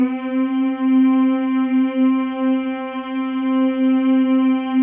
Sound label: male; Spectrasonics; Symphony of Voices